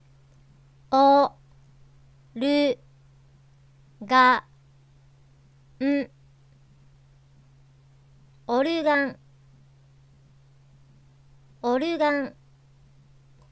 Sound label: organ